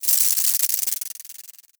rattle death layer

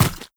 step run, footsteps, player, mud

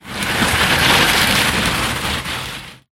drag body; scripted sequences

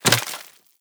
debris wood, bullet impact debris

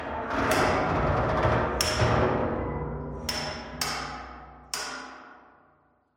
ambient pipe bang, metal

